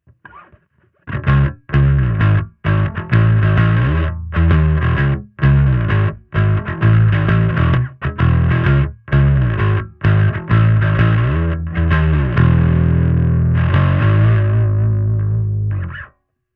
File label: Rock Drive Pick Drive